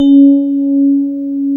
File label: PIA DX E-PIANOS